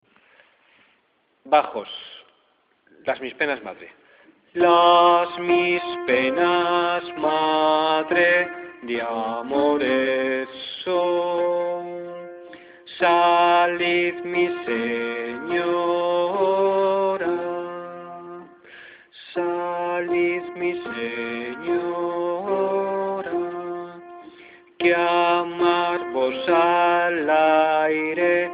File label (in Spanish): bajos; Las mis penas madre; Pedro Escobar